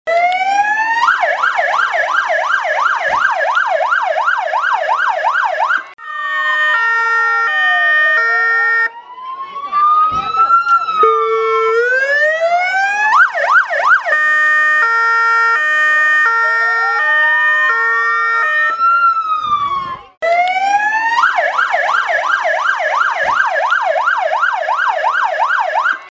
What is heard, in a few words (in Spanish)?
sirenas